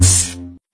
Sabrelaser; Super Minipix; android

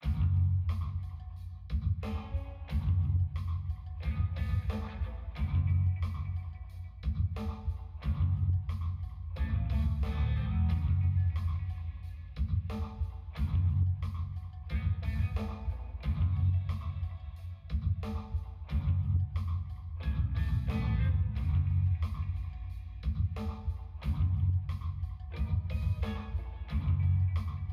Env Band

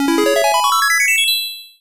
Key Item; fire and brimstone amiga

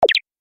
Open Future Interface Device

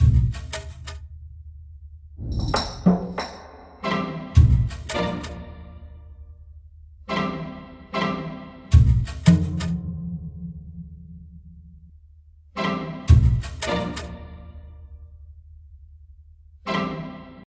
Jungle Level

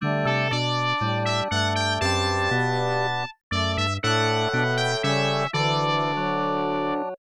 Baseball Fanfare

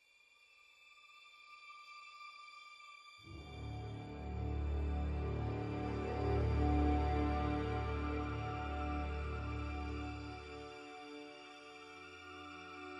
Loop Tense; Game of Thrones: A Telltale Games Series

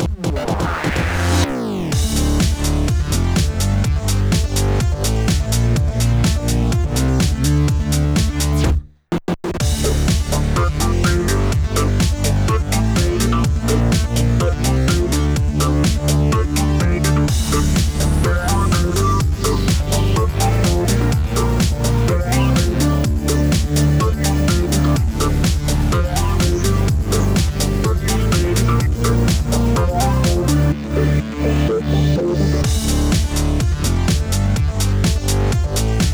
Lose; Asphalt 6 Adrenaline; ios android macos pc

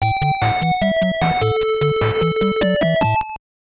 Victory!; little samson ost

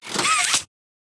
hand retract; Extendo Hands

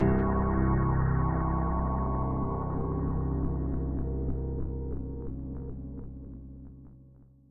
Dread sting; minecraft story mode gamerip 2016; A Block and a Hard Place

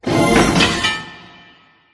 Complete Chariot; the great ottomans strategy battle for throne android

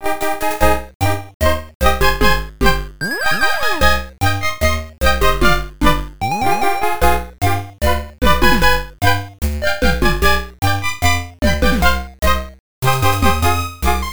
Tango; galaga 88 tg 16

